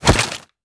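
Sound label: melee attack gun large; viper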